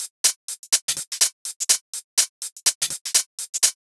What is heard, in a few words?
SHAKE LOOP 124